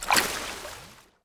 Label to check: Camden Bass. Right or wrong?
Wrong